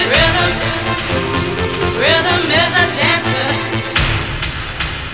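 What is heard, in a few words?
rhythm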